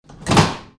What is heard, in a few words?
door